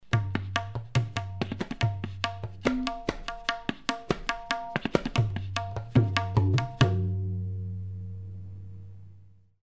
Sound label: Tabla solo